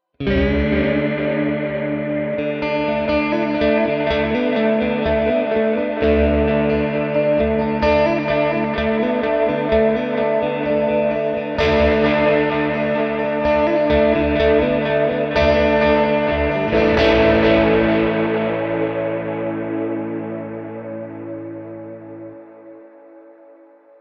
Clean; Neural DSP Archetype Rabea; IAD

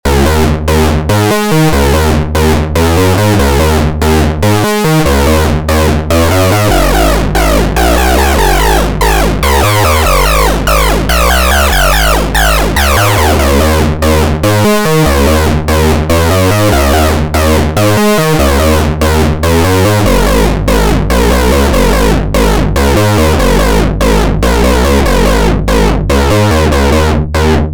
Hard Sync

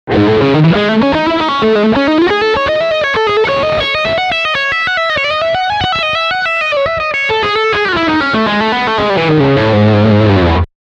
rear humbucker Lydian

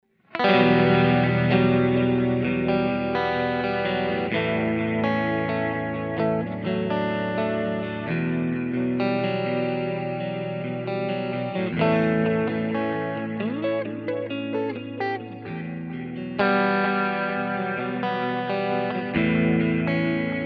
GFI System Orca Delay Diffuse

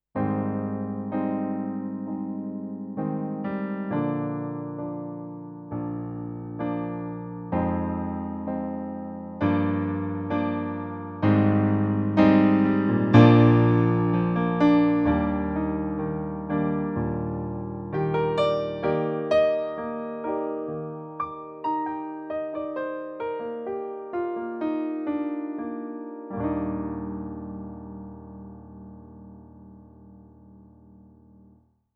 Piano

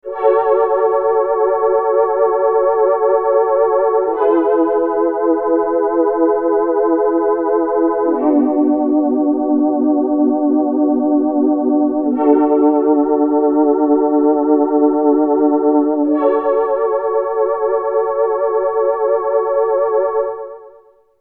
Moog MF Chorus Dark Chorus

